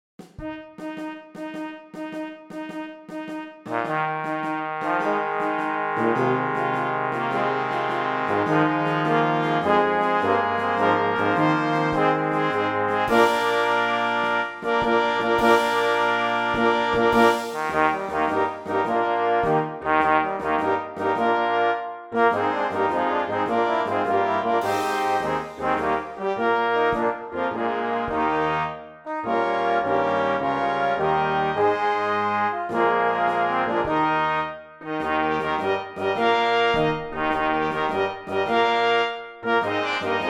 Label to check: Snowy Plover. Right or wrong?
Wrong